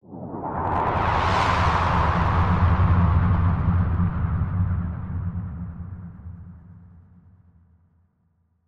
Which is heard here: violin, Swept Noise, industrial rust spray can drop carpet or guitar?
Swept Noise